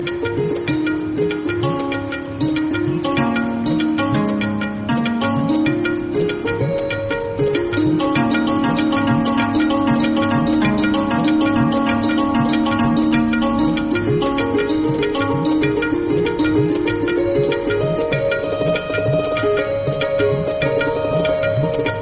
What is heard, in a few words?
santoor; classical